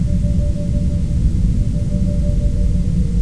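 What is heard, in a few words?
background; techno; hl1mp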